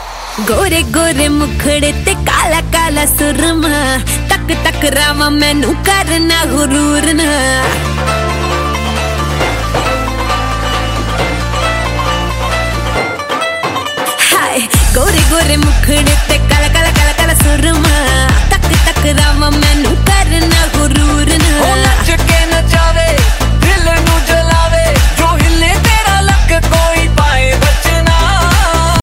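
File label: female version ringtone, bollywood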